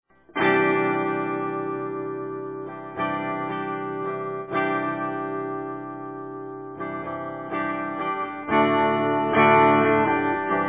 stereo test

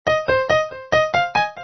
piano

